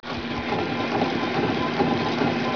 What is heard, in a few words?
washer; fatman